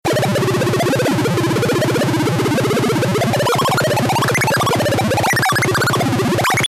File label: bleep tone